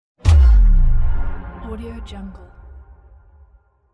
boom

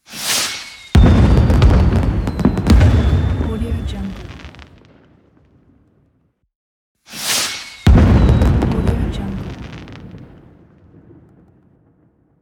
fireworks

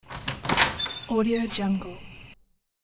door front door open night; hollywoodedge

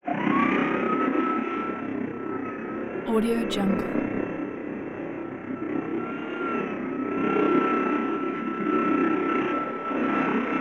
phasey drill slowed down motor rattle servo

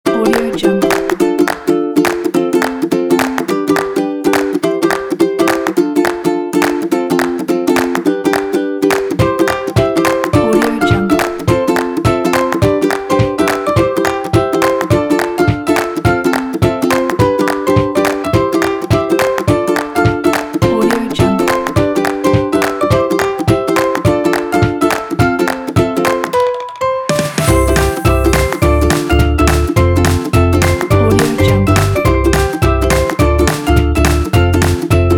cartoon

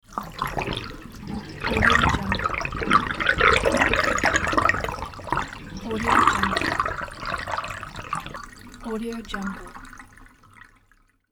water draining; ldj-audio

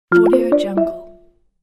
followed chat online

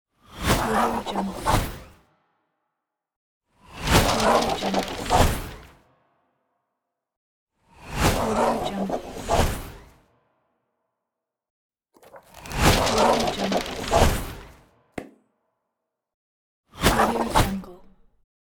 digital signature; kaelv

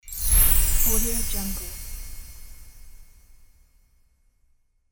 magic sparkles

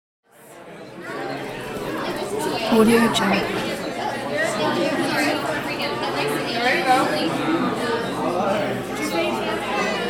crowd chatter inside

